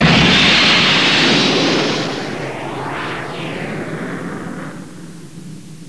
swoop

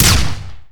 plasma shot; quake4

